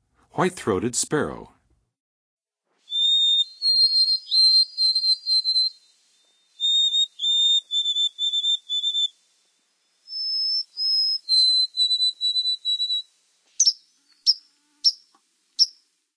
White-throated Sparrow, Songs of Birds of North America; Western USA; Western USA Songbirds